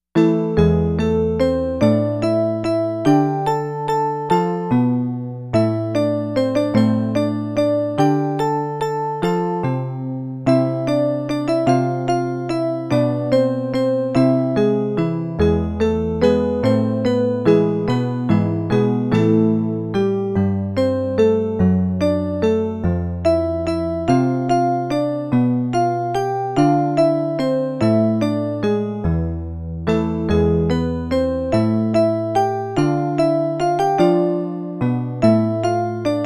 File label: menuet